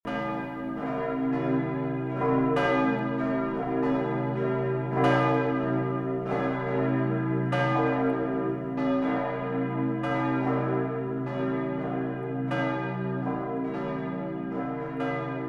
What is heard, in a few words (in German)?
Glocken